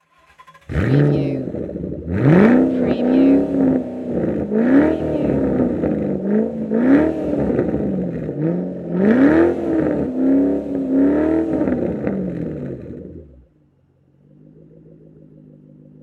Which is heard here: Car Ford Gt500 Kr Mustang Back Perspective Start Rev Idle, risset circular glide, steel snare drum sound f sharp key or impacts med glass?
Car Ford Gt500 Kr Mustang Back Perspective Start Rev Idle